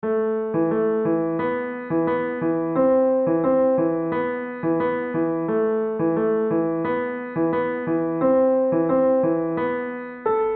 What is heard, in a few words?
solo, piano